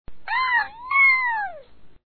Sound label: Sound Fail; meeblings gamerip